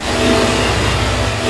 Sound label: WET, WATER; AKAI S6000 CD-ROM - Volume 1